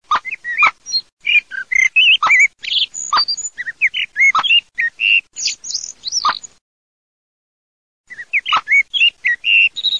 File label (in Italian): MERLO CHIOCCO PIPPO